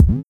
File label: Kick Ring